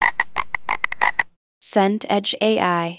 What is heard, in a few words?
frog croak